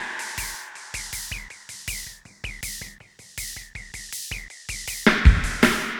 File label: DRUMLOOP, AMBNT, 160; Ambient